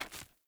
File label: Walk Stone, Footsteps; Player; Mother